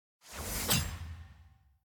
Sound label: sfx missions progress objective